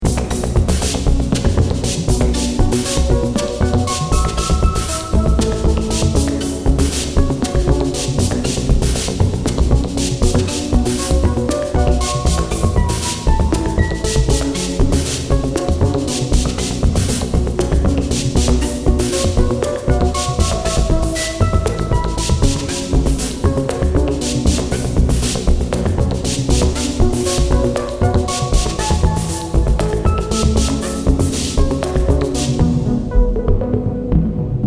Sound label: Drummer One